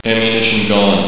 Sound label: AMMO OUT